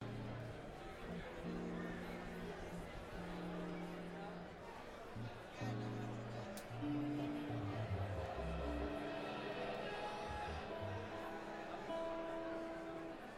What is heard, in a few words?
Tuning